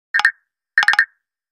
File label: Woodblock, Nokia Tones; Windows Phone apps